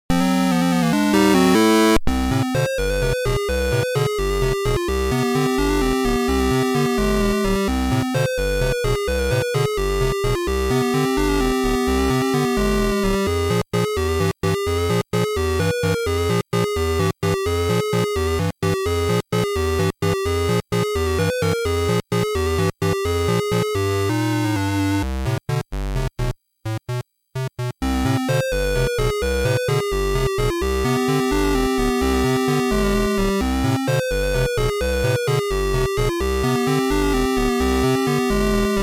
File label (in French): LA VALSE; Xanadu